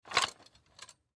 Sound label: Croquet Cart Up, Croquet Cart Pick Up; SoundEffects-Blastwave; Sports